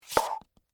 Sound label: High Heels Scrape, Footsteps, High, Heels, Scrape, Wood, Surface